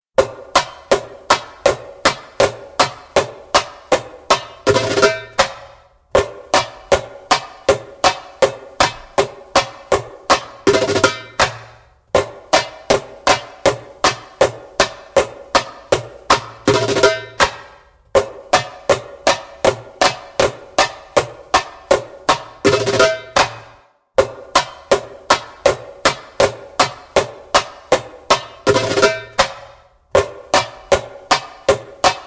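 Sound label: Muscular Moves (slow) spoken, djembe; Djembolale - Fills and Drills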